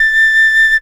flute; Winds

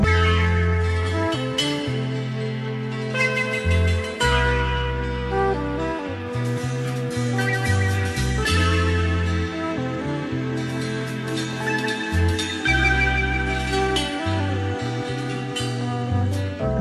flute to soothe, Jazzmatazz loops

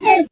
"hoo", voice

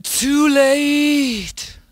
too late, sung

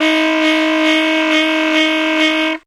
sax; Winds

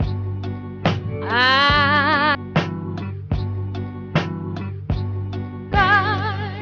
voice ah harsh; Loops; Phonky

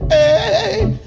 ay SUNG

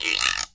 guiro

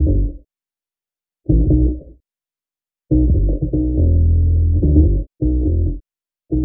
bass witness to the scene, Loops, Jazzmatazz

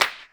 cydle, Clap n Snap, Snares

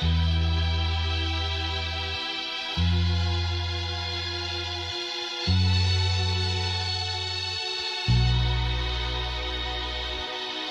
keys church suspense, jazz loops

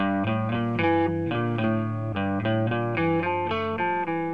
guitar, Jazzmatazz loops

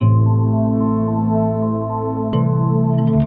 bumpty bump, Electronic Loops

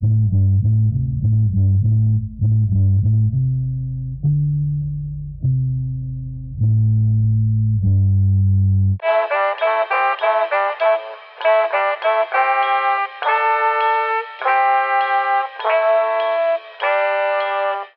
horns, phonky loops

